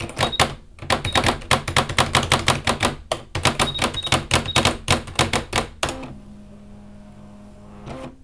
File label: typing, office; Digital Sound Gallery - Volume 1